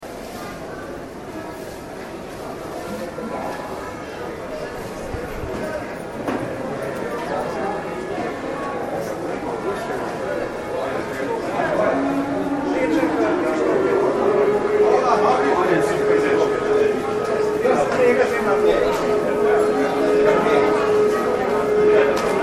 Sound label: LJUBLJANA ambient soundfield recording; soundscape